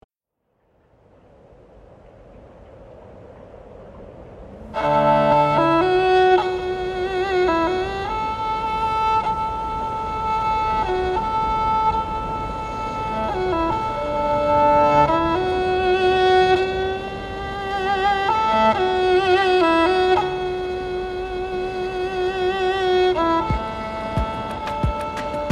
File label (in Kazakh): zvuki kobyza popurri kui